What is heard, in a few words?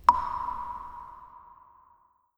Water Drop